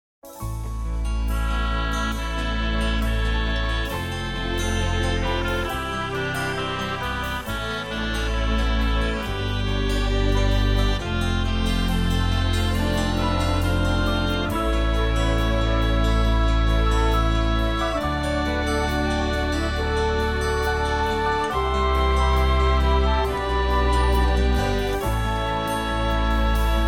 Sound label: Easy Listening & Classical; Sound Ideas